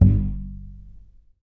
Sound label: TUB BASS G 1